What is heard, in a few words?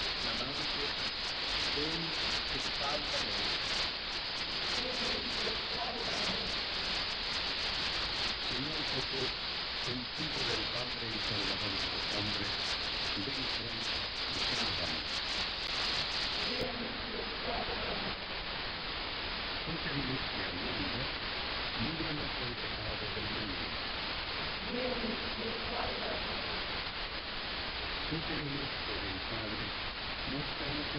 AM VS SYNC SDR